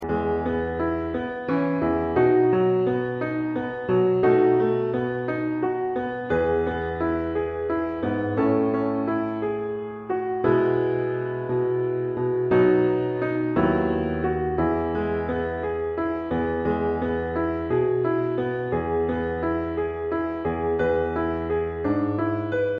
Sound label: Piano; Piano track